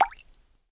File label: drip, water; World Of Goo